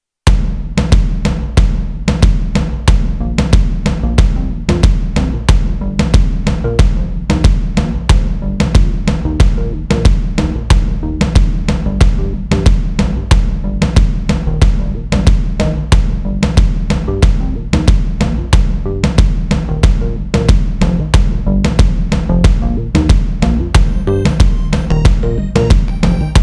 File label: Drum Rollin